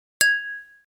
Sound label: glass